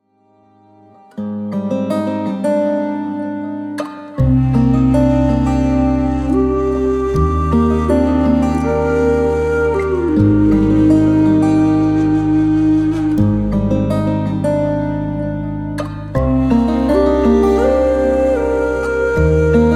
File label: Healing Native Flute